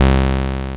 SAW WAV LO

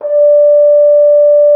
FR HORN D5; Xsample VOL-3

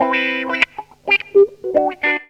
GTR, GTR RIFFS, Funky Elements; Zero-G

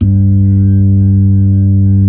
B-3; Roland LCDP10 Keys of the 60s and 70s 2

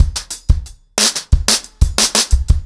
90BPM, acoustic drums, drumloops

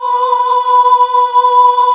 cho B3, Choir Female AH, AKAI S-1000

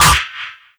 Oneshot

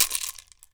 COW RATTLE, PERC; AKAI S6000 CD-ROM - WorldSounds

